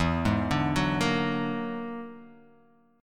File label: E 9th Flat 5th E down